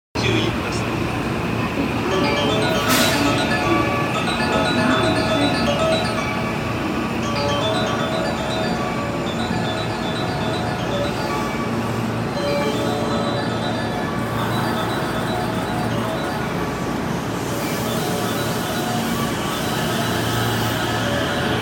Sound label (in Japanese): JR宝塚線大阪西, train sounds